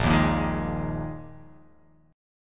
Bb 7th Suspended 4th Bb; strummed; JGuitar

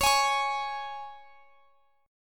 C sharp 5th, C sharp, chord; down; chord shape

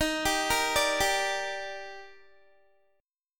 Eb Major 7th, Guitar; EbM7; arpeggiated

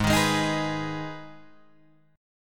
G sharp Minor G sharp down Guitar Open G, chord

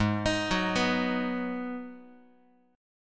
Ab Minor 7th down Guitar; arpeggiated; JGuitar

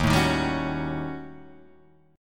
D sharp 7th Sharp 9th Flat 5th, Guitar, Drop D

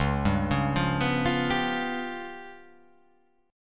C sharp 7th Flat 5th, chord

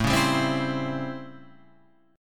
A 7th Sharp 9th, Guitar, Open F; A7#9; strummed; JGuitar

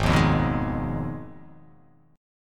Ab Minor 6th, Ab; Abm6 strummed; JGuitar

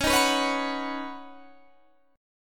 Eb Minor 7th Sharp 5th Db

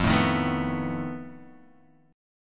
F 7th Flat 5th F down Guitar Open G

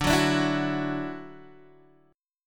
D-Major Add 9th D down Guitar Open G

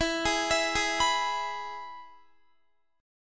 E-Major E, Guitar; arpeggiated; x,19,20,21,20,19

